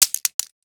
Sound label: Drop Ballpoint Pen On Concrete; The Sound Pack Tree; Dropping Things